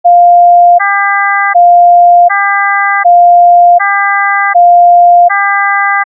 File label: Alternating Tone Complex